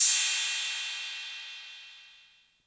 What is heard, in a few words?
Cymbal 808 Color